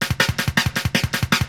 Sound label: DRUMLOOP D+B 160, Drum+Bass Loop; eJay